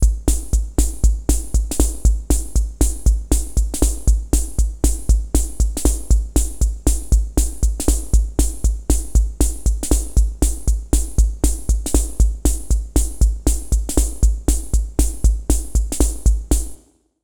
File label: Rhythm Ace 120 Bpm Foxtrot